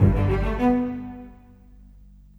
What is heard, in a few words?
Bass Cello; Sonic Foundry (Sony Creative Software); Orchestral Series VOL-4 - Rock and Pop